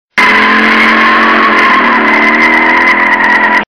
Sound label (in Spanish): quema llantas neumaticos